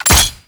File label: Shot End; Enemies; Attacks; Warframe; Grineer Ghouls